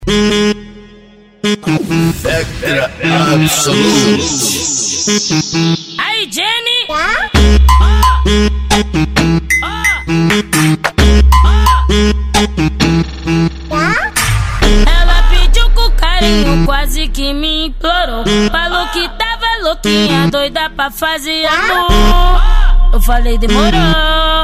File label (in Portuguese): especial funk